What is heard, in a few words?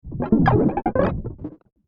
Twisted Theremin